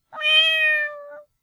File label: neko